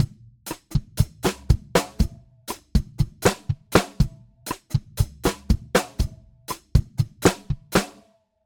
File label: Flanger Drums Wobbly Decay